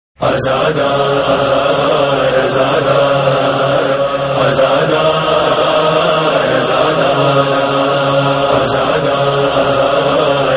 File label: Noha; Muharram 2022; New Noha 2022